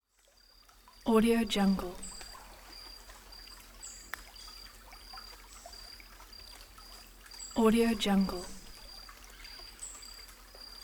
jungle ambience

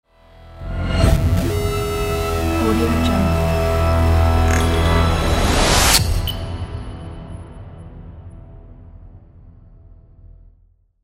electro logo; diamondc

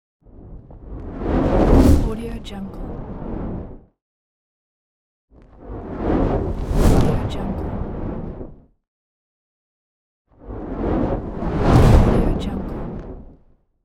big fire whoosh

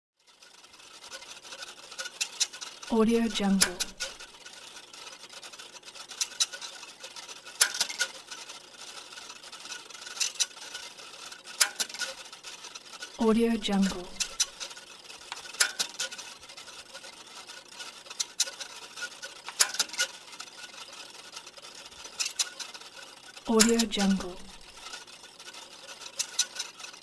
encephalogram

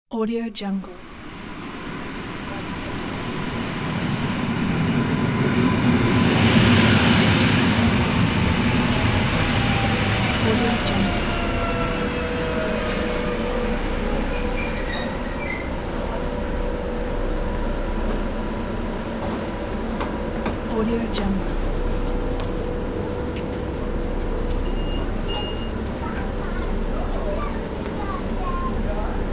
train arrival and departure; fxprosound

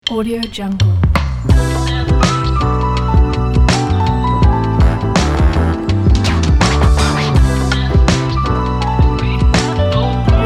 chill hip hop

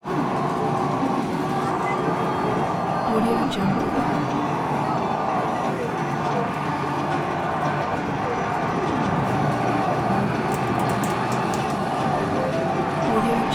video arcade many game music sound effects

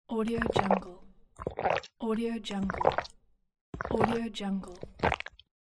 drinking

